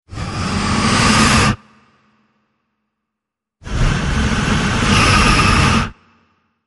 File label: inhaling oviraptor